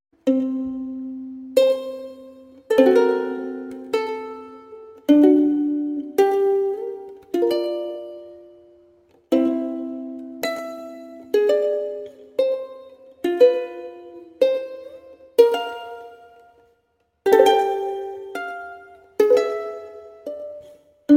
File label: uku